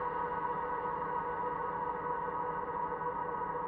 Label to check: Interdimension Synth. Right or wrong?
Right